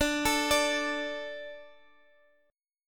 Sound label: D 5th, Guitar; arpeggiated; x,17,19,19,x,x; 4E,4A,5D,5G,5C,6F; chord